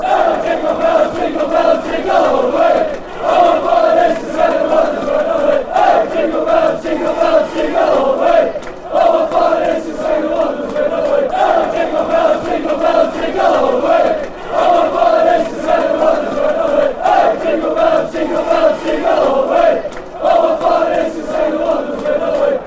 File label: jingle bells fanchants; bwfc